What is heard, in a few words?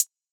Hi Hat, Closed Hats